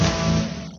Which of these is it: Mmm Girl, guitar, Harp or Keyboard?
guitar